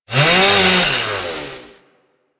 Swipe; Warframe; Grineer Ghouls; Ghoul Sawman; Attacks

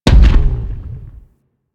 Stomach Grenade Explosion; Warframe; Warframes; Grendel

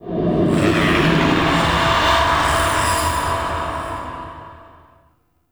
Exterior Jealous Sky Screech; Warframe; Ambience; Duviri

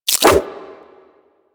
Nunchuck Start Whoosh C, Infested, Weapons; Warframe

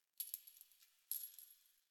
Coin Drop

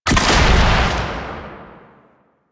RPG Shot; Hellion Elite

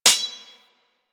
Melee Sword Impact Generic; Warframe; Weapons; Swords

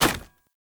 Polearm Medium Impact Wood Hollow Large; Weapon Impacts; Player; Environment; Sphere Polearm

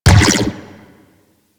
Ball Bounce Dome Energy; Warframe; Missions; Tenno Ball

